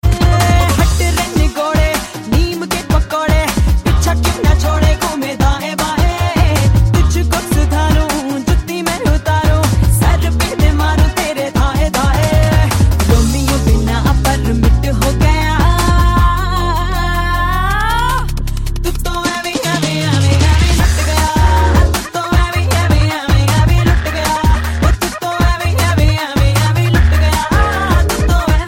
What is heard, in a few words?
Band Baja Barat